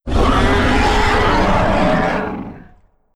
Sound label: Indominus rex Roar